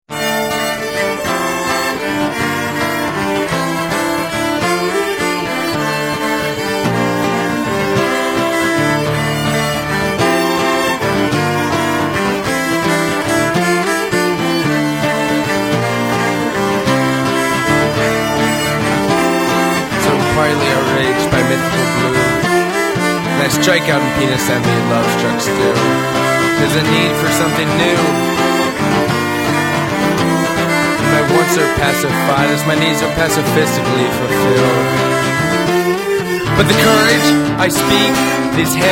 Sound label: symphony orchestra